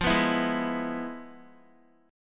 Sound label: F Minor Major 7th Flat 5th Guitar; FmM7b5 strummed; JGuitar